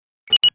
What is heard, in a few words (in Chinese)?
回應聲響